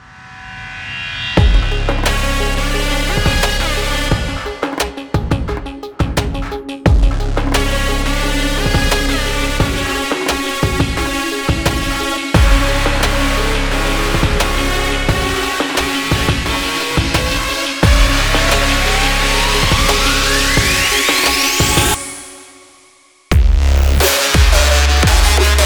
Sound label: powerful sport trap